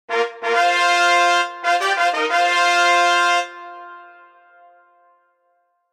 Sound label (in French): trompette